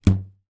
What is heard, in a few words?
foley rubber band ping; zapsplat